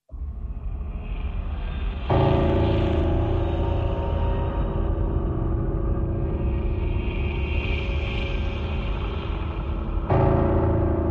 Drones Only

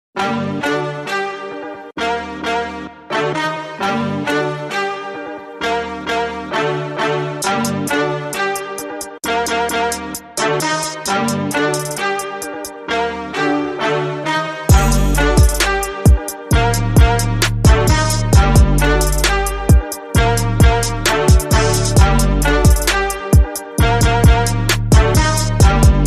No Voice Shouts

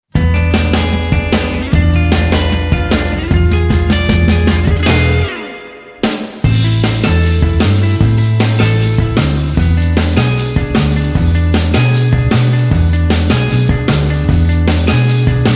No Hammond Organ

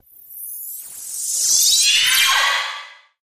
Sharp Slicing Sweep

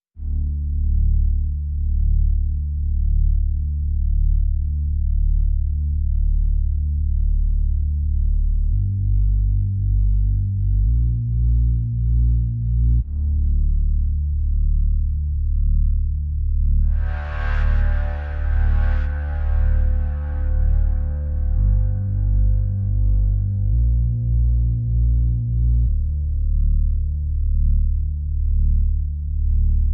Pads Only

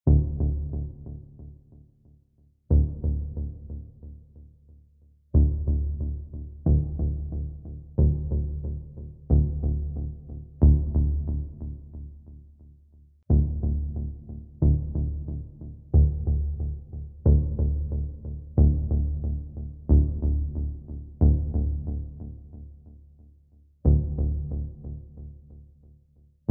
Low Pulse Mix

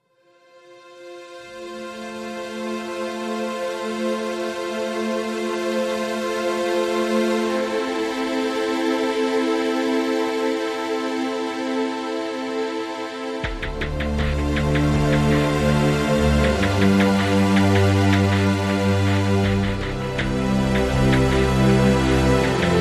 Elegant Currents Underscore

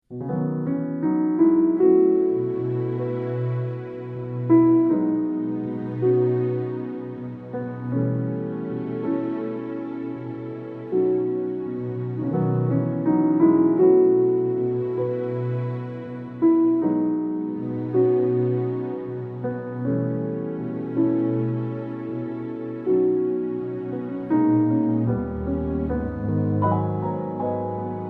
With Strings

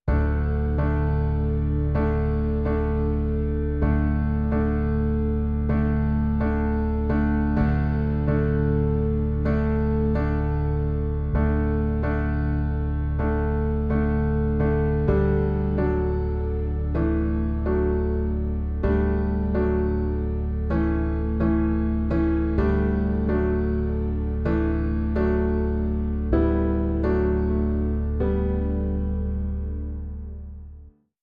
Piano

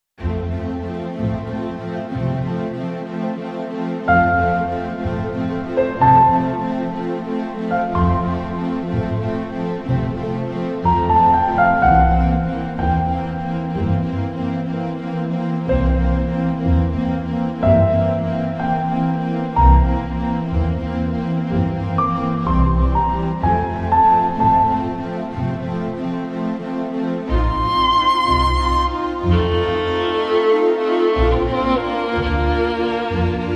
Piano Andante Short

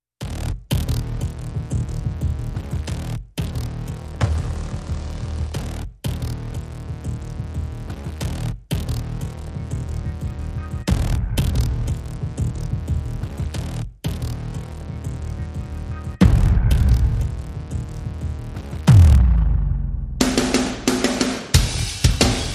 Percussion Only

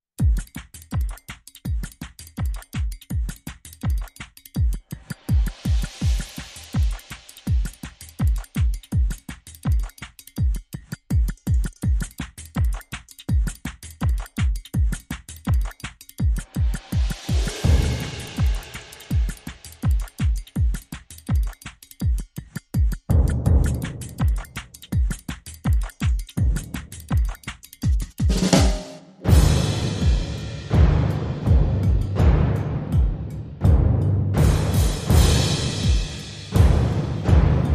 Percussion Only

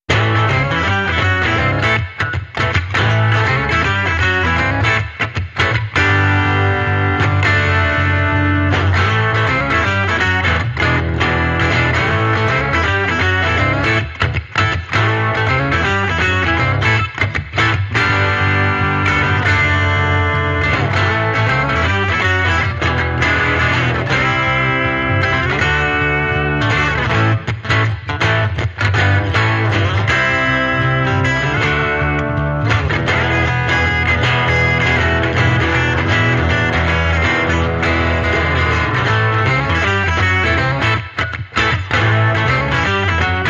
Electric Redneck, No Drums

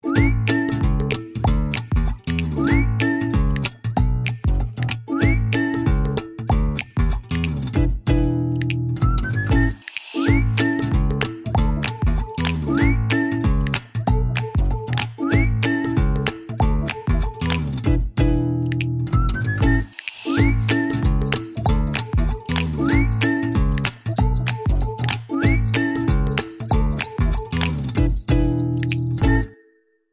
Happy Ukulele Bop

